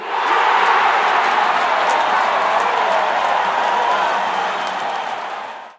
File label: huge crowd; Sound FX Real Life